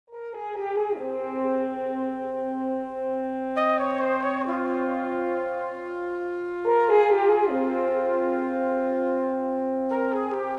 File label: Rubato